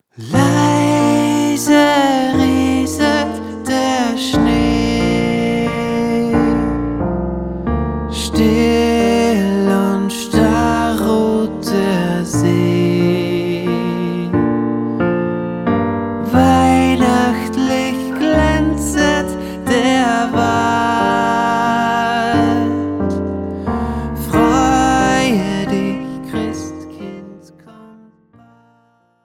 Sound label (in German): Leise rieselt der Schnee, Pop Piano; Pop Piano Patterns